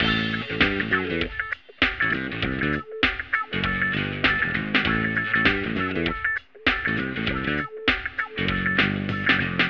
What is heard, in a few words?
Jazz; alien earth